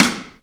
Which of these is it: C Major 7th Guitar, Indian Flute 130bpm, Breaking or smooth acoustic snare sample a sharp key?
smooth acoustic snare sample a sharp key